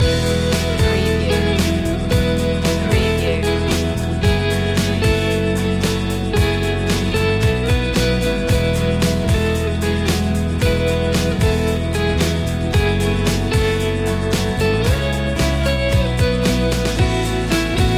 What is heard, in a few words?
easy summer loop